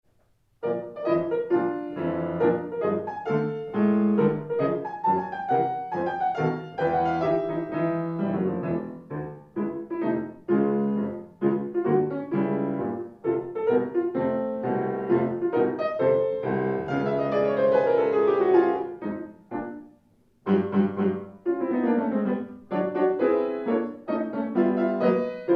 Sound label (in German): Klavierstuecke, Marsch; Fuenf Klavierstuecke, Nr. 1